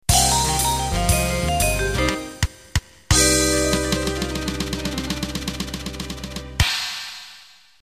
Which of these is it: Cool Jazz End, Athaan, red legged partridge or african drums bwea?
Cool Jazz End